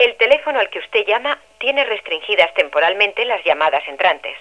Telefono

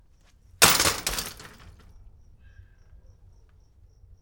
Caida Bici

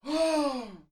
Grito Sorprendido

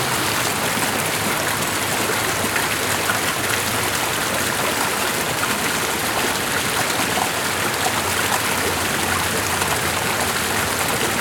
Cascada; Geo Fonia

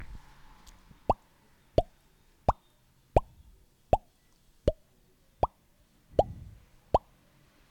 Gotera

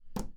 Golpe Manos Con Madera

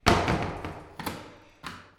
Portawc Pestillo

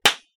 Sonido De Golpe Como Palmada

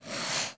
Smoke deslizandose por la tierra

